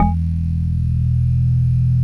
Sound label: METAL B